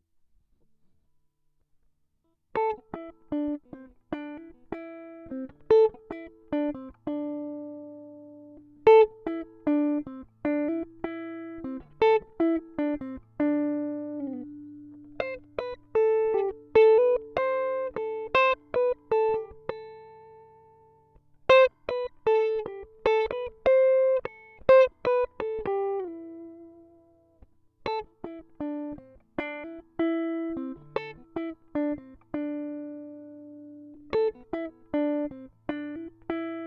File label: A152 GTR melody line